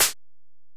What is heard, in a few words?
EPerc, Alesis DM-PRO